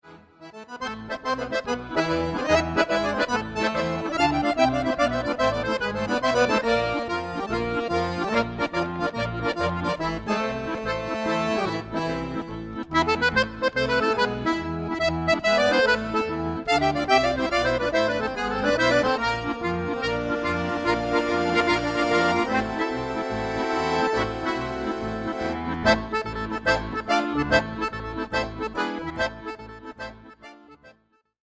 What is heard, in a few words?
accordion; zzmusic